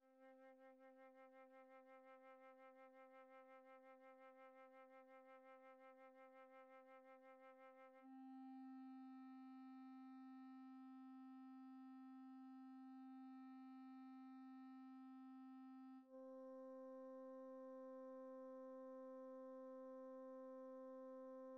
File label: Harmonics C3 Flute Clarinet Bassoon Organ Square Wave